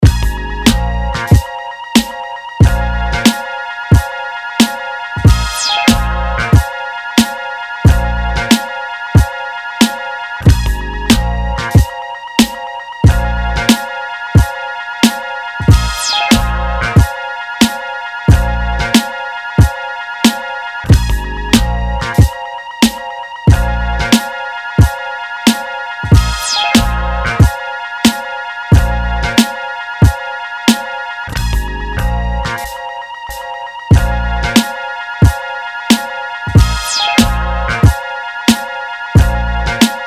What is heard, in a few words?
Beat